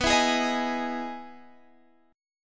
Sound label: E Major 7th B, chord